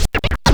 SCRATCHES; Scratch 1

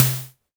polyvox snare

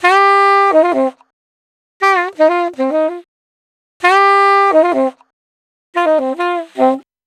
Sax 120bpm Eb, Saxaphone; Quazibeat; African Secret

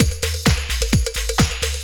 groove, DRUMLOOPS

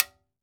H-TIMBA, TIMBALES

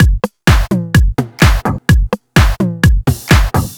Clik Groove, Drum Loops, 127 BPM; Sonic Foundry (Sony Creative Software); Underground UK House & Electro

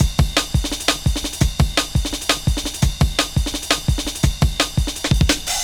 Kick Break, Drum & Bass; Fluid Dynamics

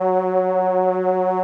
SOLINA, String Machines; Best Service; XX Large Most Wanted 2

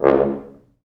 4 FrHorns

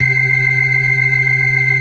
FAST VIB, TREMELO+VIB, Synth and Organ

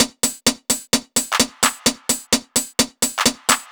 Hats, Drum Loops 129bpm; Zero-G; Soundclash Nu Dance Electro; Acidized Wavs; Typewriter

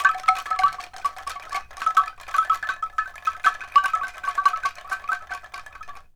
ANKLUNG LO; ILIO; Synclavier Percussion 2; World & Orchestral

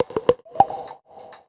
PERCS, Roots Funk; USB Soundscan vol.41; AKAI

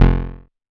bass F1, Roland MKS-80

